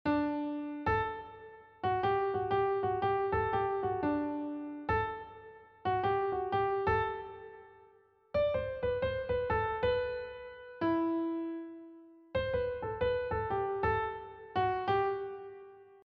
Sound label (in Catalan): Dictat jazz